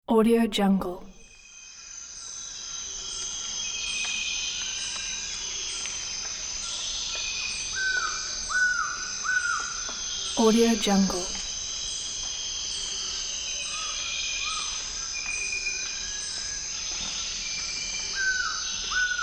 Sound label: forest ambience